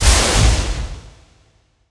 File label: hurry up thunder